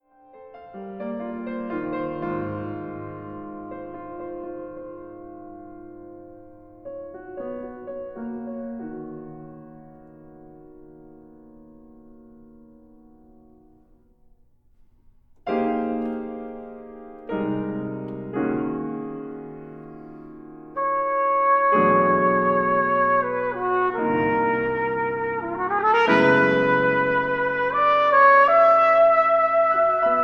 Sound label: trumpet and piano